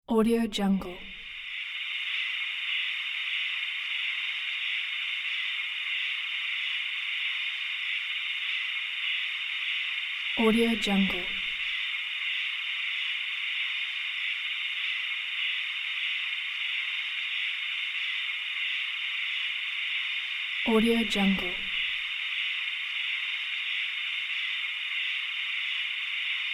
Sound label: night jungle